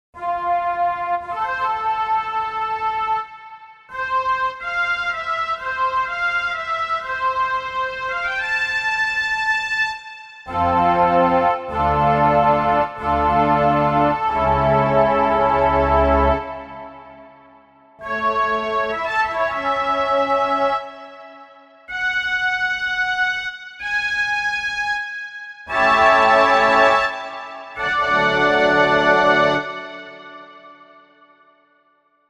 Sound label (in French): VOIX CELESTE, Church Organs; Universal Sound Bank; Samploscopie Classic Vol. 1